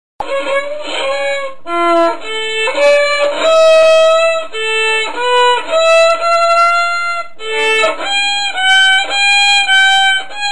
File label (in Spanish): violín desafinado; sonidos insoportables